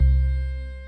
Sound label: Clean Bass; BB 2HTC Samples Vol 4